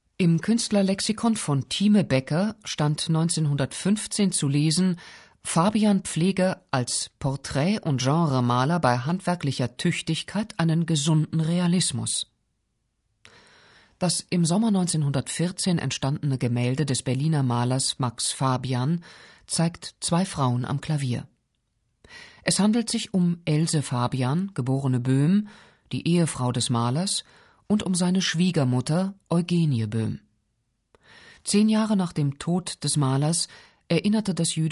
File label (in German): klavier